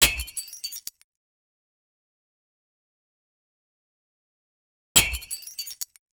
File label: glass break